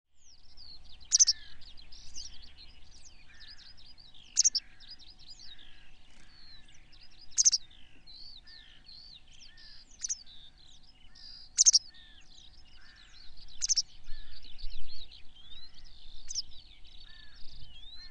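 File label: white wagtail; Helskyvä kirkas ”tsi-lit”, joka toistuu myös yksinkertaisessa lauliussa; västäräkki; yleensä ihmisasutuksen tuntumassa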